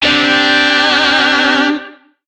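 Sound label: Shawzin Pentatonic Major Chord; Warframe; Lizzie Shawzin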